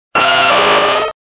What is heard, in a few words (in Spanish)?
Grito de Rhydon